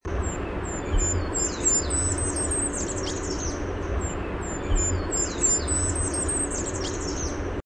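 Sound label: song, Golden-crowned Kinglet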